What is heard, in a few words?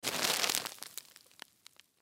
Wrapper Pick Up Plastic Movement; Materials; Blastwave; Foley